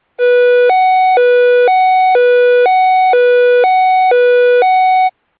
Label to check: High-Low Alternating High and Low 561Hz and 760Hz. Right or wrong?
Right